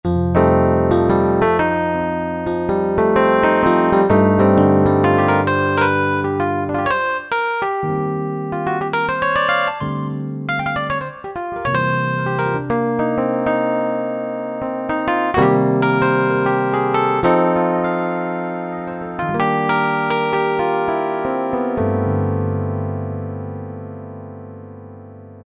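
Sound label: Piano, Yamaha EX5R; Factory presets; Pf-VintgCondns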